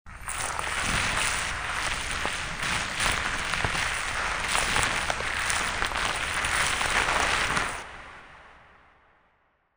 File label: alien walk